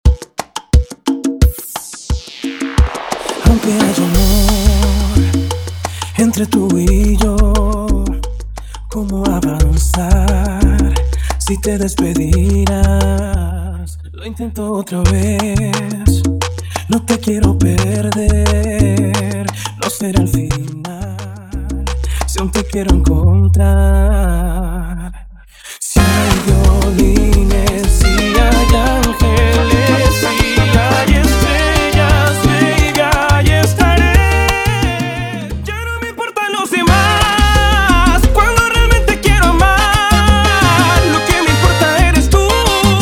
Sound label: acapella 88 bpm; Latin beats; simple acapella clean